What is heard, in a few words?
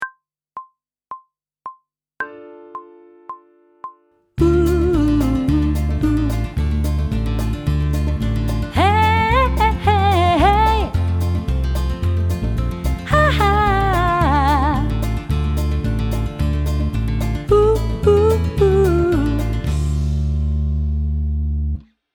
Technical Work Riffs D minor (with vocal), pop vocals; grade 2